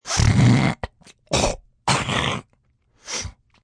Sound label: Snorting, male, clearing throat (human voice); VoiceBox